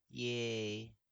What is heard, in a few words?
yay